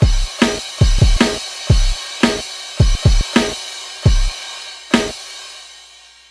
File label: drums, Hardcore Breakbeat, 152; Big Fish Audio; Hardcore Breakbeat Action; Robot Live